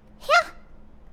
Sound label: Attack, human girl villager; customnpcs